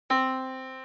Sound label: yamaha Disk